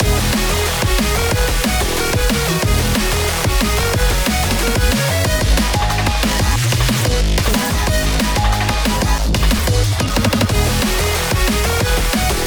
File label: Boss Loop; Goddess of Victory Nikke